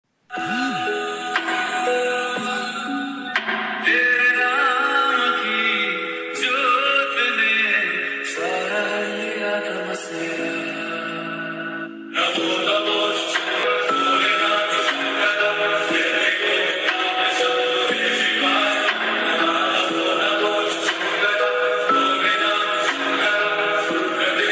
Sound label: song ringtone, mahadev ringtone